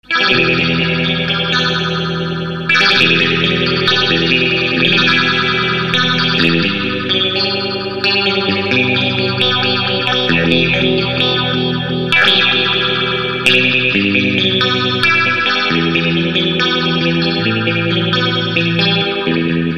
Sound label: MUTRON BIPHASE